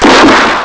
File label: explod; ST-XX Amiga Soundtracker Sample Packs